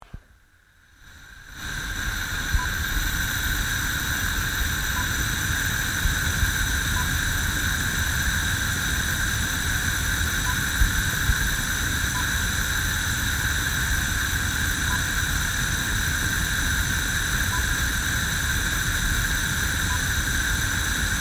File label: Gas Hob Gas